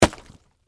Impact Watermelon, Texture, Drop, Fruit; Splat; Drops

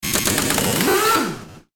Stutter Digital Distortion Rev

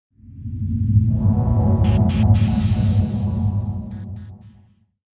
Scifi Audio Logo Deep Space Pulsating Tone

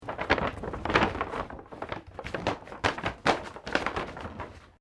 PAPER BAG LARGE MOVEMENT

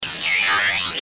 Chopper Stereo Sweep; Blastwave; Chopper Sound Effects

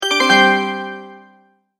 multimedia tone alert

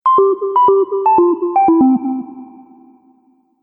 Soft Subtle Notification; Musical

